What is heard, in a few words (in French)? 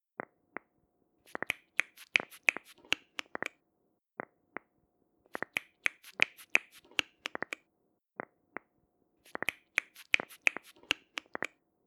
craquement doigts